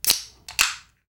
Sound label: can open